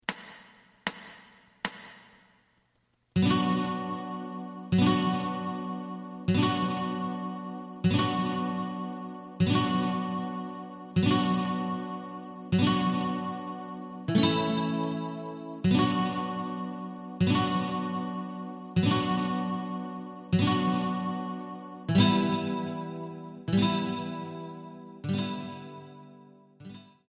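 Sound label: lead guitar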